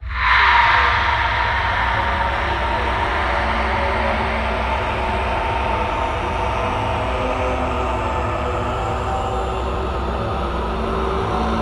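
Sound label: Production Element Bed Lost Souls; Sound Effects Bible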